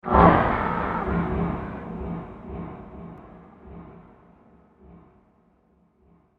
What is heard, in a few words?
Rumbling Motion, bomb sound effects; explosion; Andrew Fleming